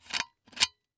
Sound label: Industry Tool Gun Glue Gun Pull Trigger